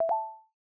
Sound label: go forward or confirm